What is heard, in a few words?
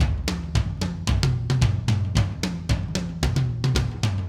Acoustic Live Drum; Platinum Audiolab